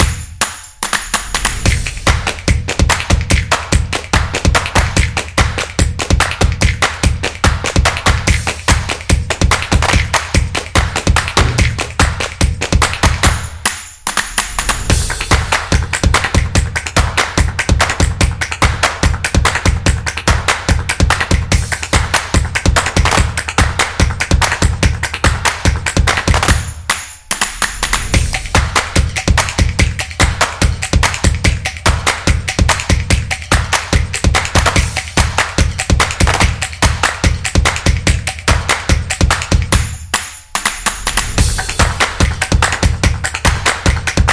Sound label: Fun Music; fast tempo